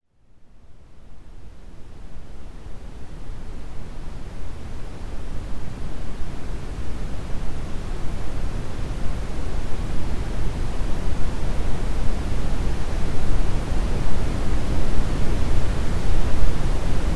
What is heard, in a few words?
Brown Noise